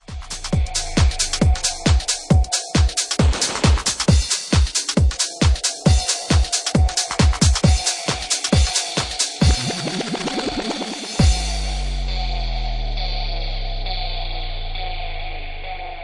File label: Bass